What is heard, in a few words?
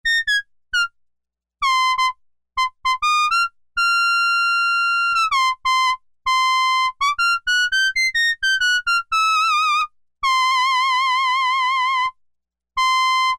trumpet I, Korg M-500 micro-preset